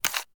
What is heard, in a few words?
infantry change fire rate click; war ui class specific; esmteam